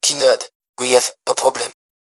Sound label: jingle